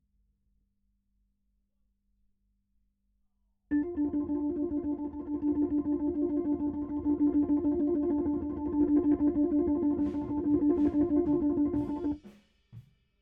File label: HAMMOND BOT